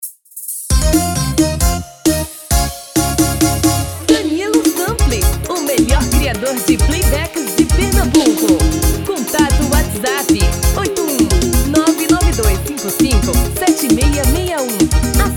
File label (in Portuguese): Forrozao